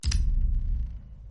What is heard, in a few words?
click